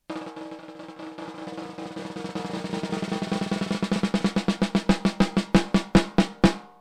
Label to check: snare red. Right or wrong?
Right